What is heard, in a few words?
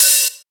open hat b key, open hi hat tuned to the B note. Loudest frequency: 8180Hz